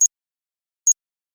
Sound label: ping, respawn turret